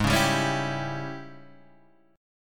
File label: G sharp Major 7th Suspended 4th Sharp 5th; G#M7sus4#5 Chord {4 4 5 6 5 3} strummed